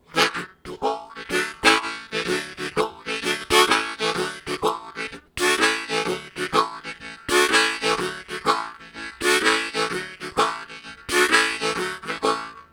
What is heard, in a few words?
Low Chugger, Harmonica Riffs; Zero G - Harmonica